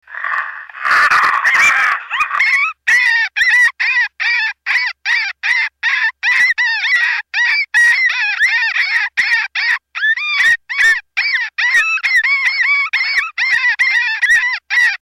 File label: Fox and bird